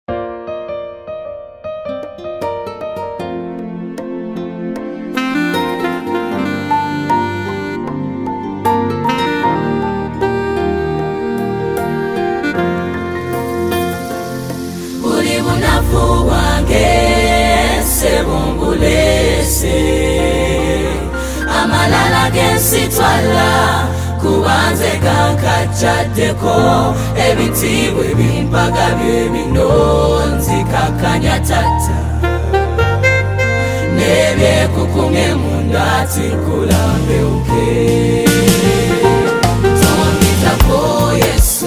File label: Live Performance by Choir; Stream Of Life Choir; Kennedy Sec School